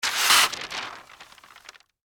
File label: Fly Paper Remove, Extermination, Fly, Paper, Remove, Down, Insect, Bug; Miscellaneous Insect; Blast Wave